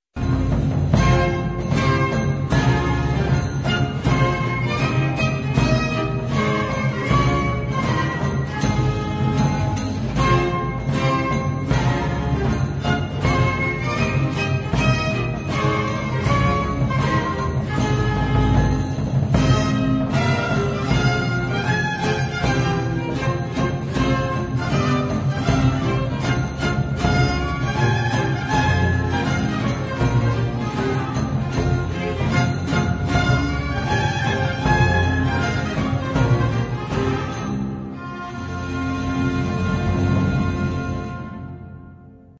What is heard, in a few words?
marche; lully